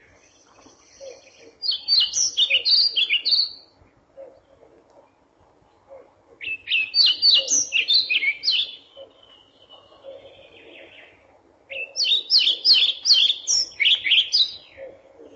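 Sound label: muholovka pestrushka golos, pied flycatcher voice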